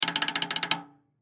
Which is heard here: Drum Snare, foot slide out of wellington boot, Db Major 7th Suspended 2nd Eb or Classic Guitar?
Drum Snare